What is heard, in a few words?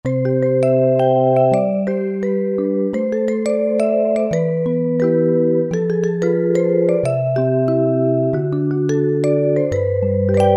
Night Kalimba, Roland JD-990; Factory Patches